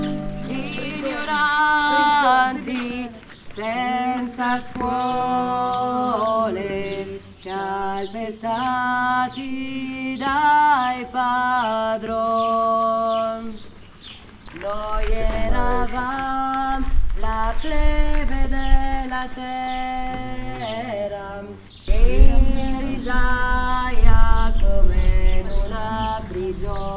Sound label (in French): Ignoranti SOPRANE; Pellafol